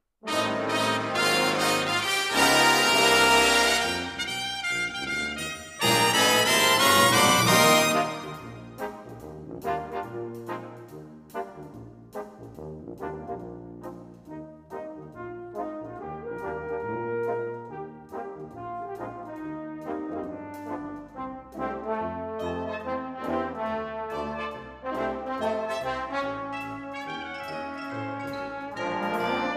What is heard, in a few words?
brass